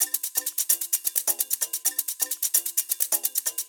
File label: Live Percussion, 130 BPM